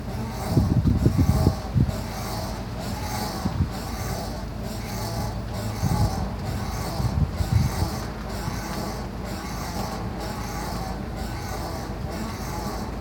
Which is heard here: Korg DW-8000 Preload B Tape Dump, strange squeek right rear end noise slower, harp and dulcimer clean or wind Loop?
strange squeek right rear end noise slower